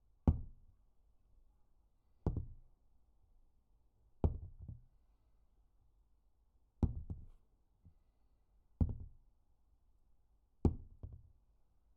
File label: Clay Falling On Table